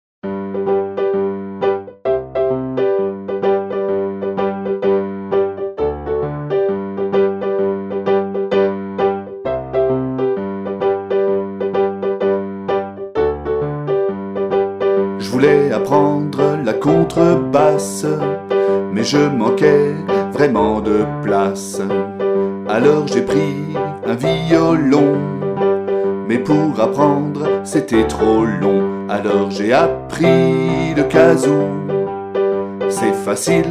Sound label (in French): Le kazoo